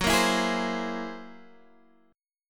F sharp 9th Flat 5th, Guitar, Open D; x,9,8,10,11,10

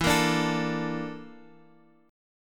A sharp Major 7th Flat 5th E

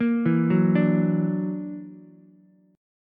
F sharp Augmented 7th F sharp Ukulele; F#+7 arpeggiated; 3,4,2,5

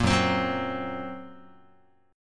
F sharp Major Flat 5th F sharp Guitar; F#Mb5 strummed

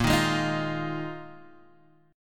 Bb 7th Suspended 4th Bb

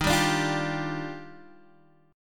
Eb Major 9th, Guitar, Open D

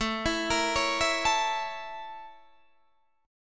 A sharp Minor Major 7th Flat 5th A

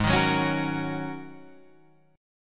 Ab 9th Suspended 4th Ab down Guitar Standard; Ab9sus4 strummed; JGuitar

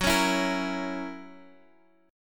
F sharp 7th, Guitar, Standard; x,x,4,6,5,6; strummed

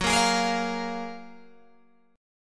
Gb Major 9th, down, Guitar, Open G

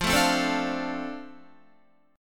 F 6th, Guitar; F6 strummed; 12,12,12,14,14,13

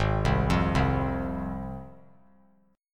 G sharp 6th G sharp down, chord; arpeggiated; JGuitar

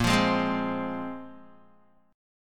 A sharp 7th Suspended 2nd, Guitar; A#7sus2 strummed; JGuitar

